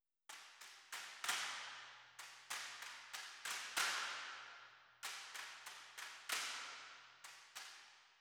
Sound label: bamboo; combat results win; Netease